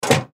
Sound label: Weight Scale Metal Antique Step Off; Miscellaneous Household; Blastwave